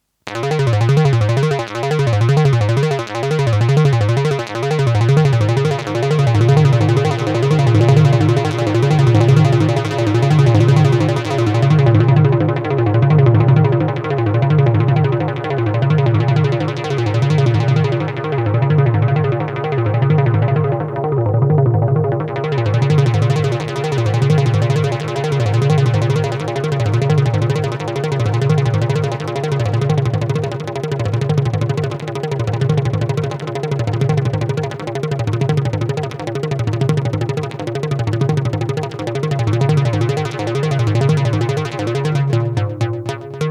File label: Lexicon PCM70 Double Delay Synth